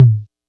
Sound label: kit, Yamaha Motif-ES8